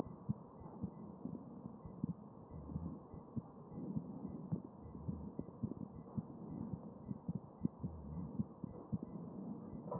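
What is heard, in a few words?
anterior chest, position 4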